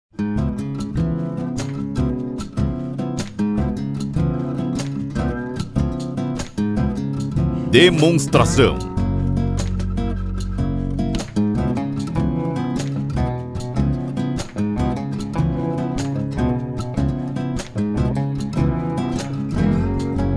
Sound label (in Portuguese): Trilha